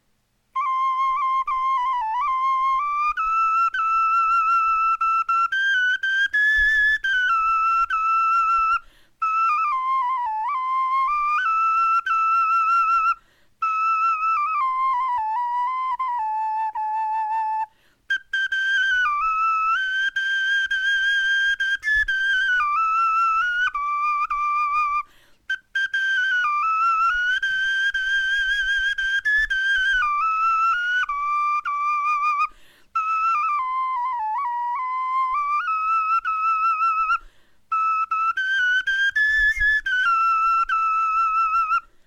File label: melodie, knochenfloeten